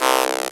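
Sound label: synthesizer; Best Service Gigapack 1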